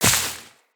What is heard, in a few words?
Step Veg, Footsteps, Walk; Tenno